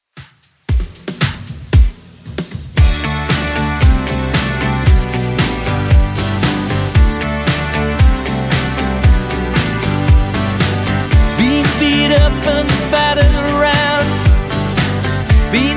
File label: 80s Redrum 115bpm